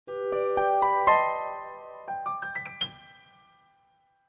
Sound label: Message tone; Samsung; GT-S5520 Nori; Alert tones